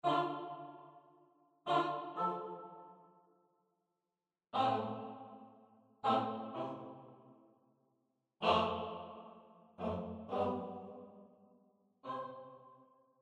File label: Staccato